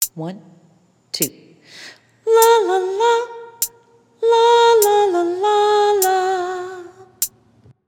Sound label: Melodic Dictation